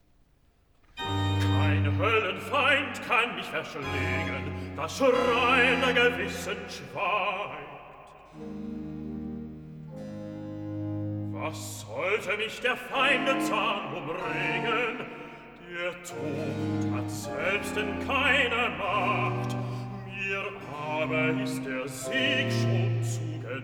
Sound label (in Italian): Recitativo (Bass); Epiphany; Cantata, BWV 123 'Liebster Immanuel, Herzog der Frommen'; IV. Recitativo (Bass) 'Kein Höllenfein; Bach; Weimar; Leipzig